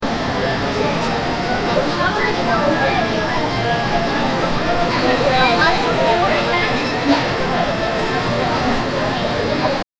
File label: ambiance, retail